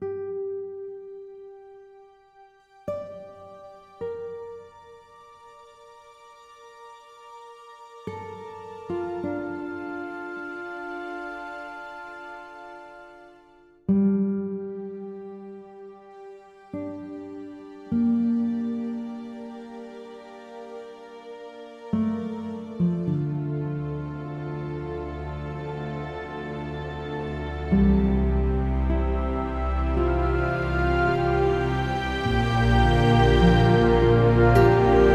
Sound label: Slow 52BPM, Cinematic Trailer Music; BlueFX